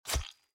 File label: sword Blood